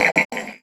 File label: Vocalizer; FX; Sonic Foundry (Sony Creative Software); Synchro Funk